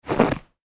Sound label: human footstep snow; Single Footstep On Snow